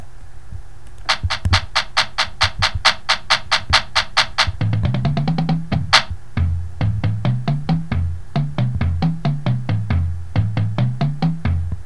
bass, drumline

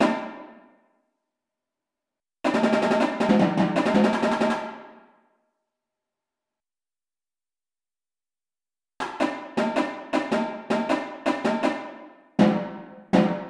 tenors, drumline

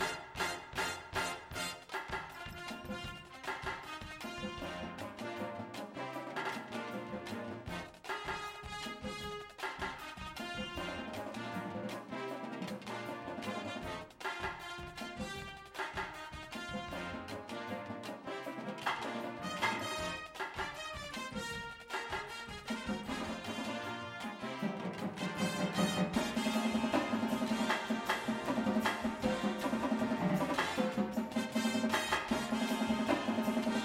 tenors w music

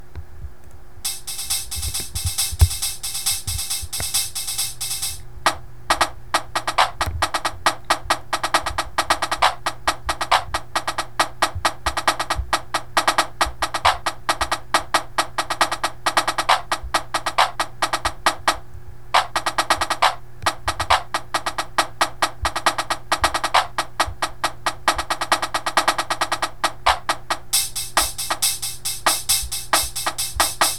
snare

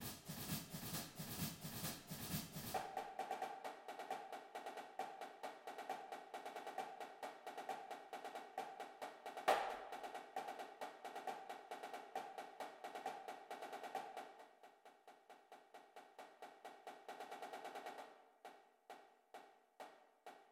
snare

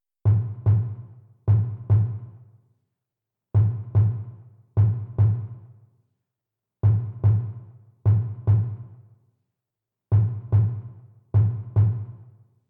bass

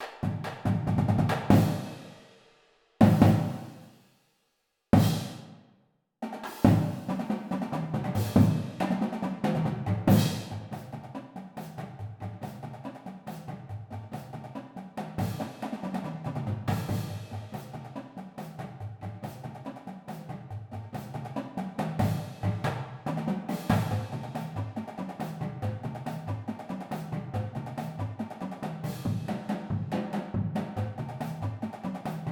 full drumline

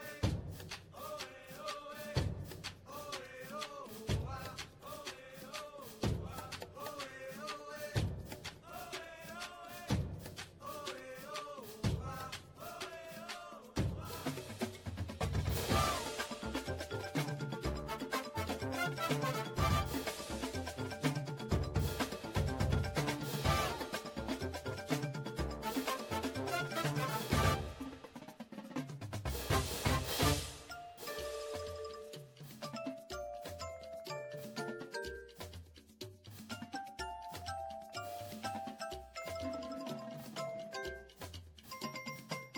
cymbals with music

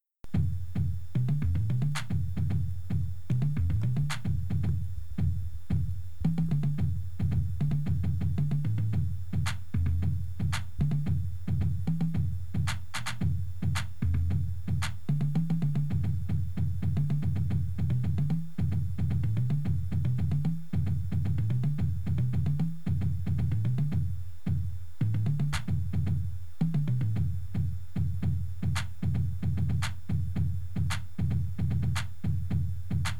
bass

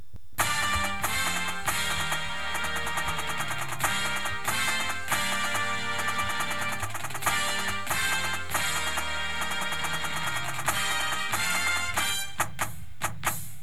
snare with music, drumline

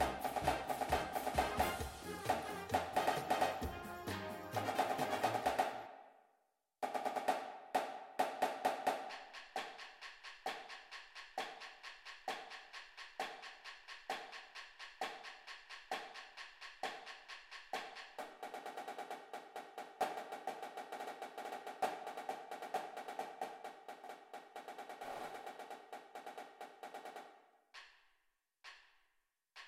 snare w music

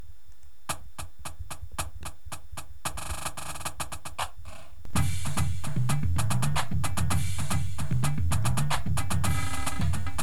full drumline

